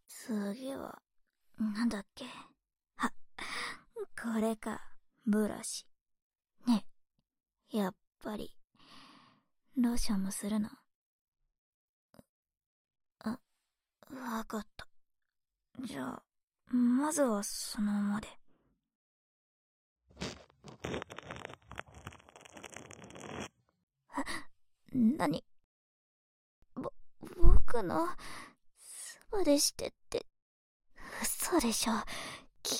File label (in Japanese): 右 耳ブラシ, ASMR; 耳かき小分けトラック